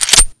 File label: deagle reload cylinder close; tf2classic